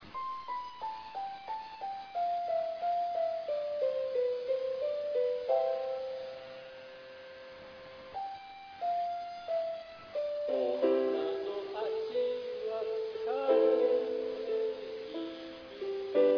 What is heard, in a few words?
taiko